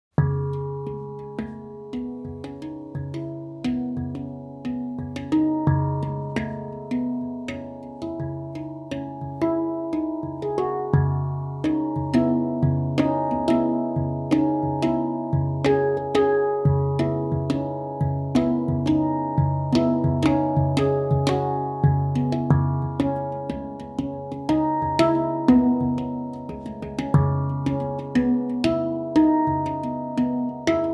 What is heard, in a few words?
Moon2 CIS MINOR, moon ii handpan ciss moll